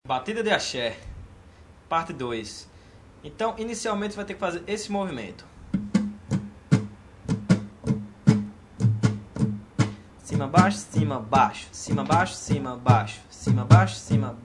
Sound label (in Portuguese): Batida de axe